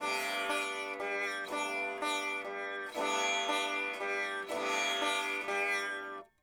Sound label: sitar